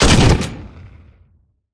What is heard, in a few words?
fire, weapons